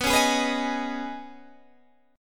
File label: Db 7th Suspended 2nd